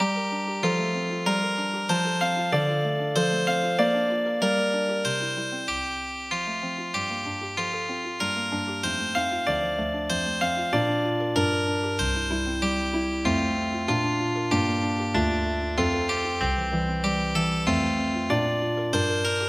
Healing Harp; Promenade

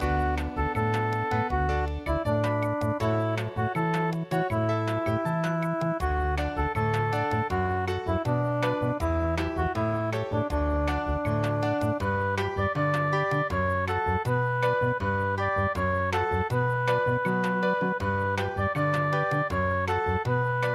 bossa

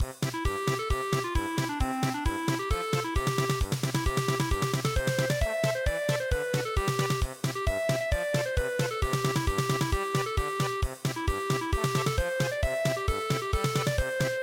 polka; rabit dance

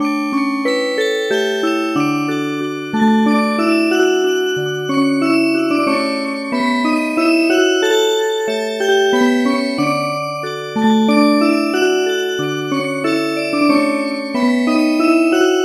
Music Box, midi; Abschied Von Den Bergen

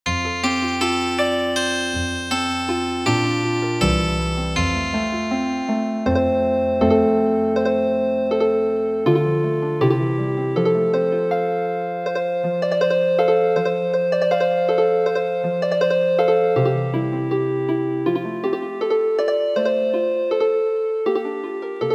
Healing Harp, traditional

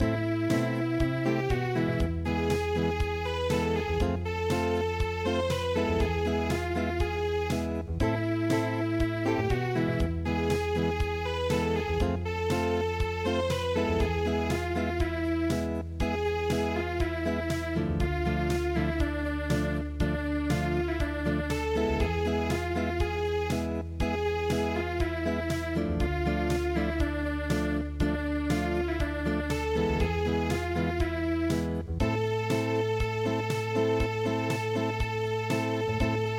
Unplugged, midi; Hava Nagilah

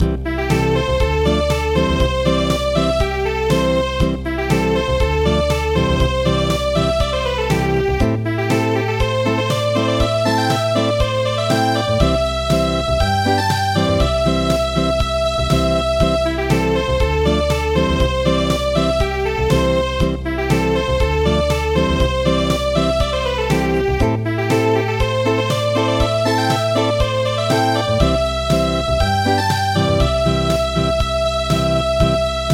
Acoustic, traditional; Thaleba

